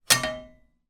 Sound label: frying pan pick up from stove